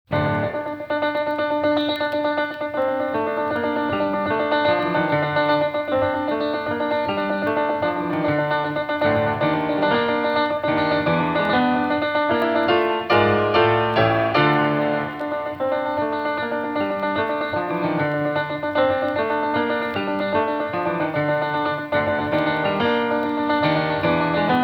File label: Allegro Precipitoso Pursuit Intense